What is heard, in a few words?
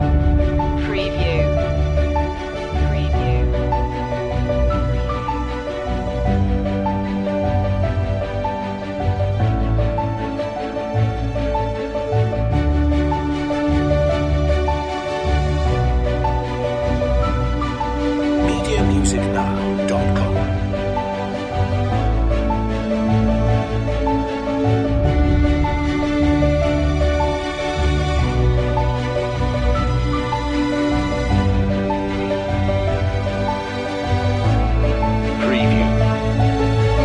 loop, orchestral soundtrack adventure fantasy